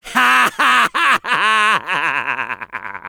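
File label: laugh happy, French; Medic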